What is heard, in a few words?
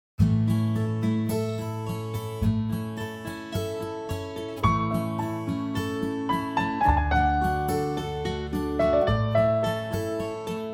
acoustic A